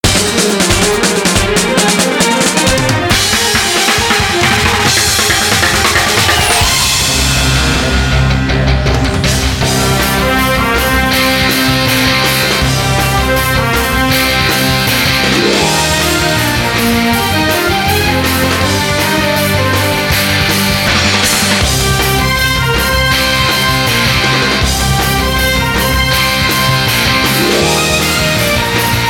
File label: Instrumental Game Theme (Title Screen); Speed Racer The Videogame; PS2; Wii